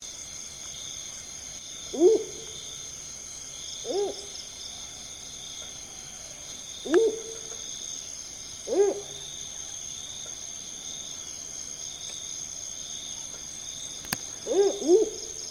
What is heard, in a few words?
Strix seloputo, Maleise bosuil; uilen; Strigidae